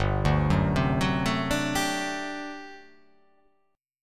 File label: G Major 7th Suspended 2nd Suspended 4th G down